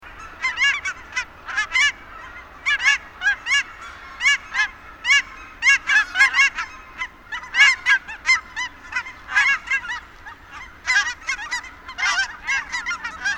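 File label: cackling goose voice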